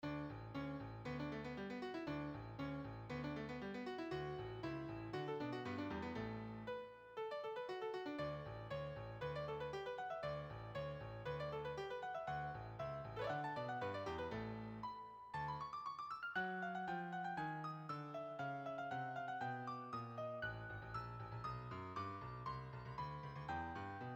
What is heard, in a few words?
Melody Bass